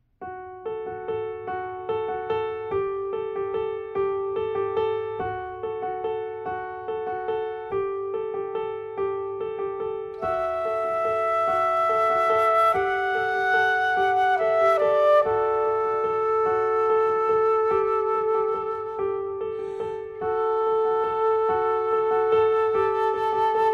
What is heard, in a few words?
Garden Flutes, orchestral